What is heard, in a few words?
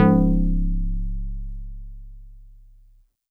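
StarPiano DX100 C#0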